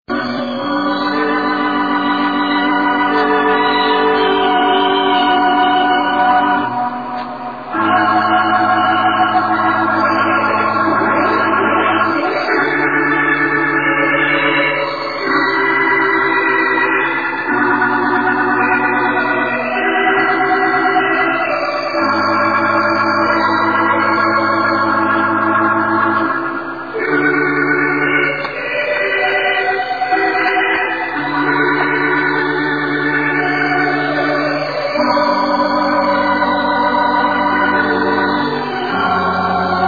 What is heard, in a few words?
piano